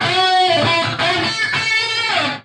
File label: straight up guitar lick